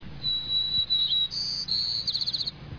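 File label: white crowned sparrow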